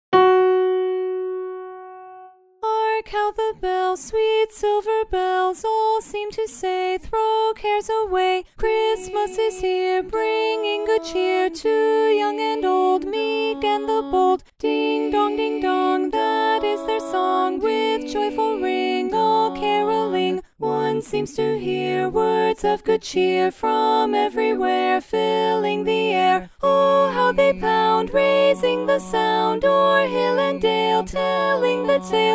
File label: Carol of the Bells Tenor Dominant; holiday music